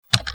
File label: TAPE